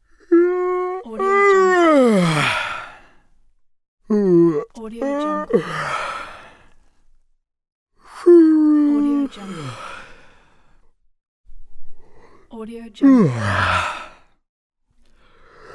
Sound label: yawn man